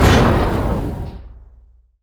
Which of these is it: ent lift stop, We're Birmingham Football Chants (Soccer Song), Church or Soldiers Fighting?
ent lift stop